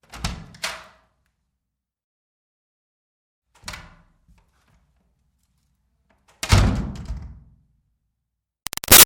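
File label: Door Wood Church Basement Room Open Close Hard; Miscellaneous Doors; Big Room Sound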